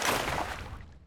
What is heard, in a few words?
draw jarate; tf2classic